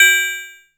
BRIGHT, PERC HITS; Zero-G Ambient 1

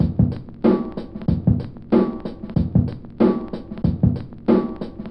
Hollis, Drum Loop, Yamaha DTXpress

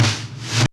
REV SNARE; Back In Time Records; Big Bang

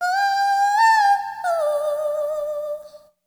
OOH RIFF 2-R, Vox FX; E-MU Formula 4000 Series Vol. 1 - Hip Hop Nation

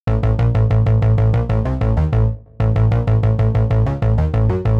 Tense Bass 95bpm A; horrorscape

